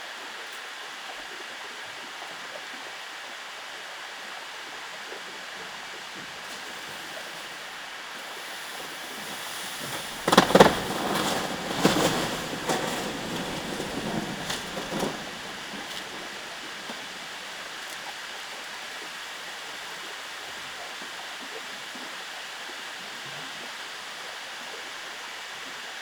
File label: iceberg calving; scripps